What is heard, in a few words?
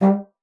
G3, BASSOON; C BASSOON; Xsample VOL-6